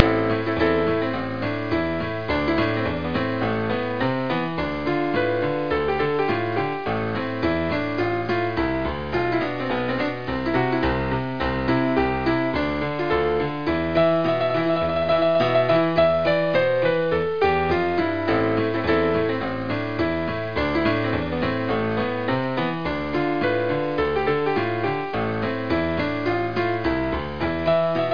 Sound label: Saloon Ragtime; freddi fish 4 the case of the hogfish rustlers of briny gulch